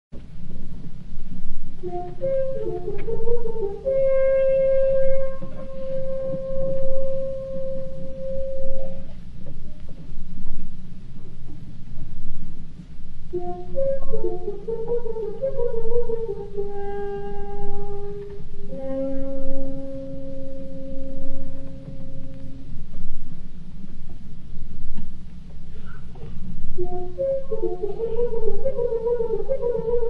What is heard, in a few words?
Horn Call live